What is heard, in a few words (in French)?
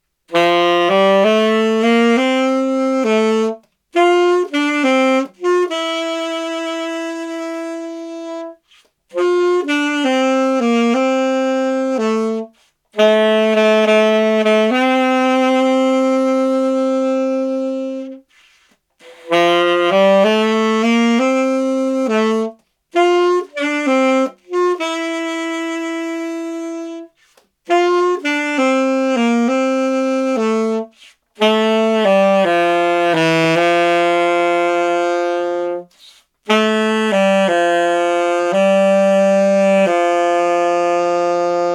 impression en cours saxo